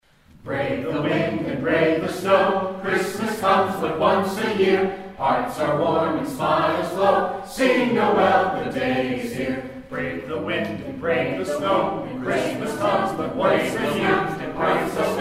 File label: VOX